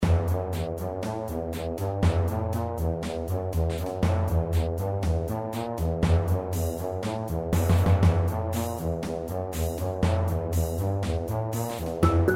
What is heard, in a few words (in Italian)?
Musica Nemico